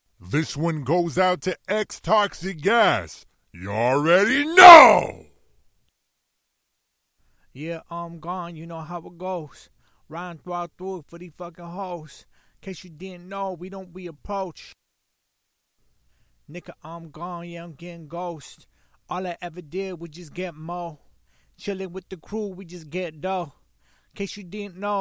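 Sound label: hip hop 94 B minor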